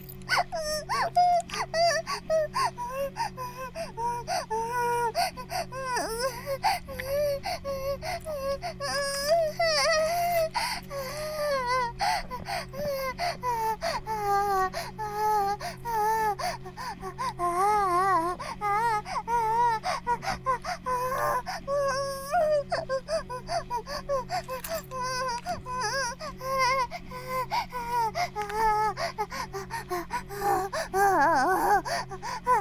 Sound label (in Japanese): バイブ放置, ASMR